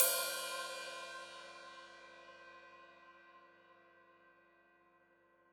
Hat Open, Hi-Hat; Deeply Sampled Drums

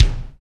kick grind, ambient kick; Roland L-CDX-01; KIK Ambient K1

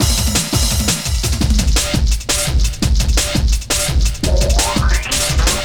Swift Beat, Drum & Bass, Beats & Breaks

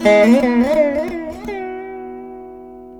VEENA; Spectrasonics; Heart of Asia

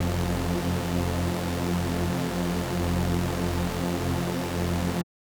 SuperSaw F3; SuperSaw1; KG MegaSaur; M8

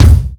KIK; Greytsounds VOL-1; SDX KICKS

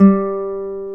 GTR CLS GTR, Nylon String, Classical; Roland L-CD701

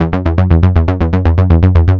Progressive Synth, Trance

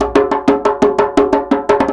Percussion Loops, Bongo & Conga; Houseworx

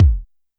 BD Tight; YAMAHA RM1X

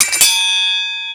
sword hit; Total Control - Action Cutz

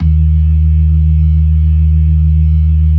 LO MANUAL VIB, Organ; InVision Interactive; Keith Emerson; The Most Dangerous Synth and Organ

